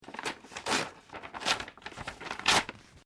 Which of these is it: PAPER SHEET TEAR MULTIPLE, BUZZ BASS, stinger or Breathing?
PAPER SHEET TEAR MULTIPLE